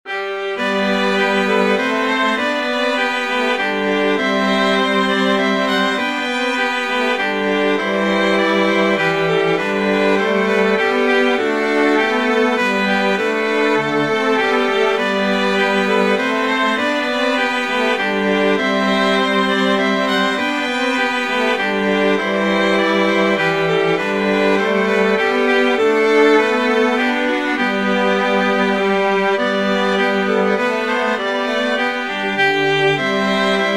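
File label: Minuet in G major trio; J S Bach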